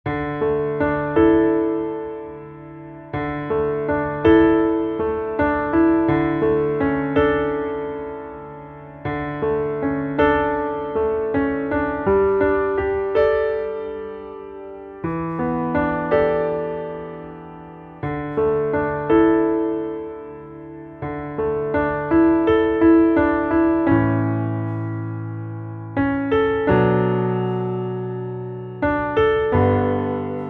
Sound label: Underscore PIANO; Worship Flow